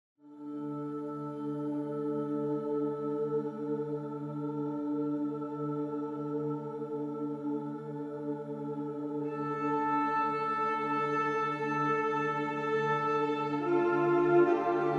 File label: Outro Music; bramble the mountain king; ps4 ps5 switch windows xbox one xbox series xs; Skogsra